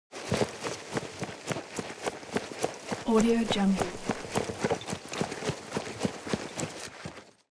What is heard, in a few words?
foley cloth nylon leather run sequence; smartsoundfx